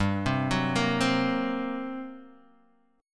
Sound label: G Minor Major 7th Double Flat 5th, Guitar, Standard; arpeggiated; 3,3,4,3,1,x